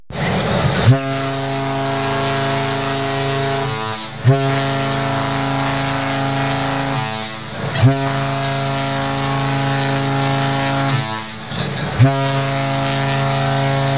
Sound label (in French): Corne de brume 8bits